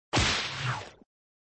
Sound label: Whip smack; Replay